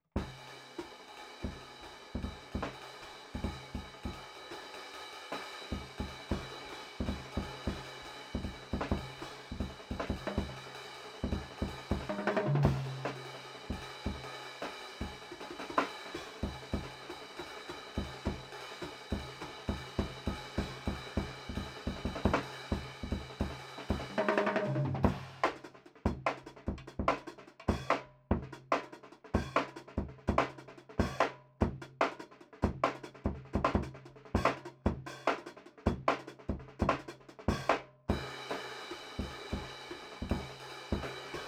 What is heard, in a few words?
TRASH ROOM, DRUM N BASS:JUNGLE BEATS; RAW MULTITRACKS